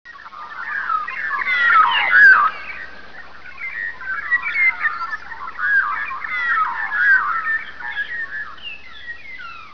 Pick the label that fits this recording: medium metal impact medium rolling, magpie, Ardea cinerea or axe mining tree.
magpie